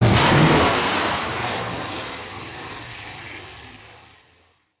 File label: Big Static Blast